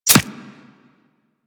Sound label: Player Arrow Impact Flesh; Warframe; Weapons